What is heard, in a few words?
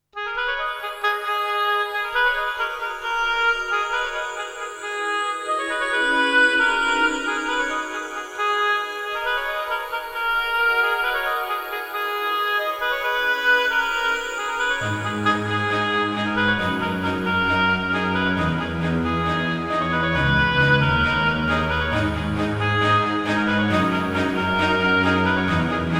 Oboe Melody; Vaporwave